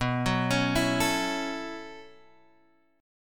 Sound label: B 9th Suspended 4th B down Guitar Open G